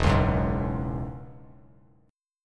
E 6th Add 9th E down Bass Standard; E6add9 strummed; JGuitar

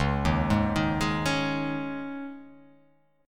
Db Suspended 2nd; arpeggiated; Dbsus2; JGuitar

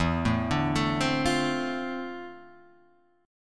D-Minor 7th, E; Dm7/E; arpeggiated

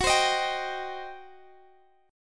G Major 7th Suspended 4th Sharp 5th, F sharp

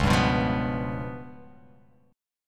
C sharp 7th Flat 5th, chord

